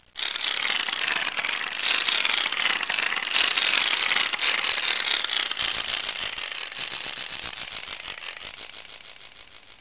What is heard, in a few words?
crack